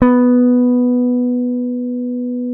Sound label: P BASS SUSTN F B3; Sampleheads; Will Lee Bass Library